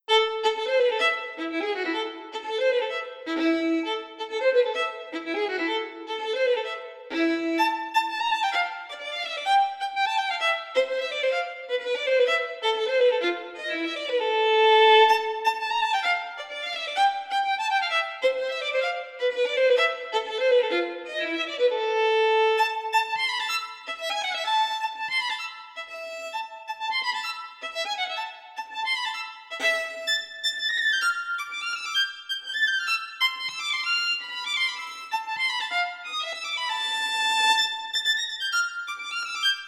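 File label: violin; Embertone Friedlander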